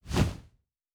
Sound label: Wing Flap, Monsters; Fantasy Sounds Bundle